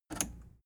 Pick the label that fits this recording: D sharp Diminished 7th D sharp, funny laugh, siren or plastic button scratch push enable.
plastic button scratch push enable